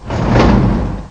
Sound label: SLIDE